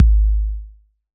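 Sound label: TR-808 BD, bass drum; Roland BK-5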